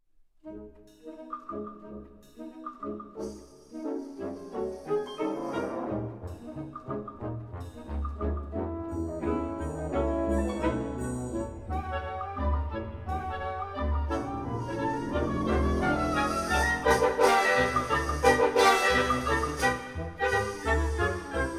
Concerto for Trumpet Wind Ensemble, Vivo Presto Allegro; Concerto No 2